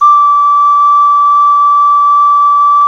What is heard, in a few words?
Clay Flute